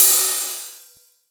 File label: Rides, Cymbals